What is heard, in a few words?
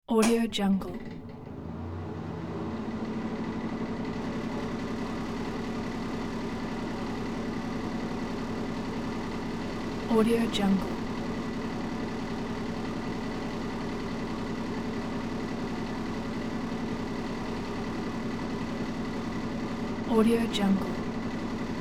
bathroom fan